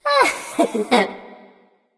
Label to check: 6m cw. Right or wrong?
Wrong